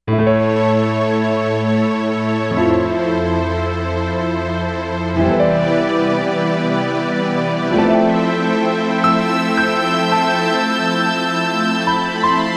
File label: Piano Strg